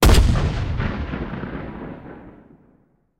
aa 37mm fire, guns

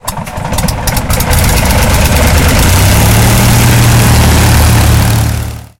engine start; War Thunder

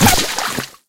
bullet hit water

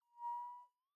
sting bird swoo; Rust

